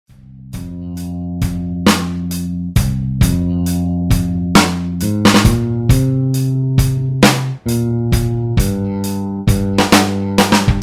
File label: rock